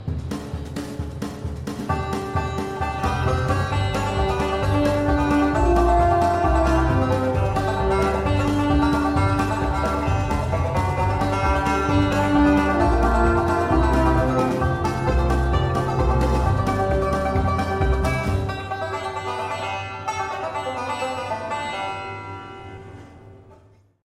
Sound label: Jukebox banjo